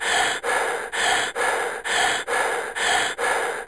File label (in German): Atmen